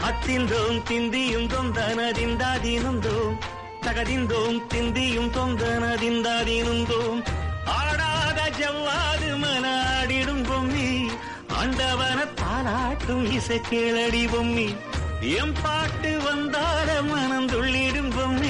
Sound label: tamil old song